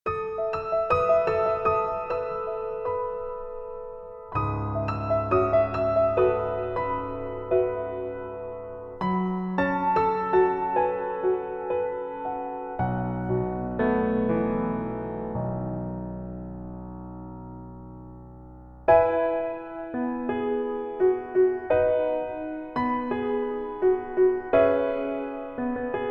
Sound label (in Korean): Piano ver, 멜로디MR -1키